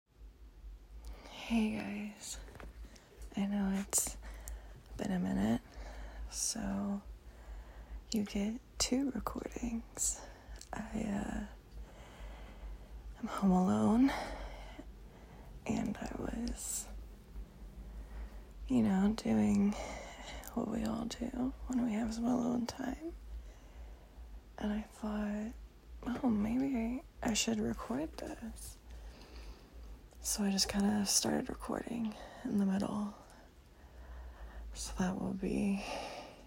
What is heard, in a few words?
F4M, F4A, Ramblefap, wet noises, improv, real orgasm, Rambles and wet; mommy